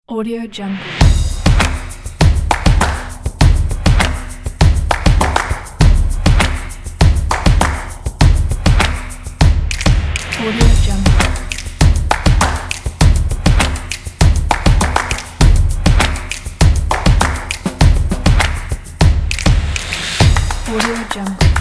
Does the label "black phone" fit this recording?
No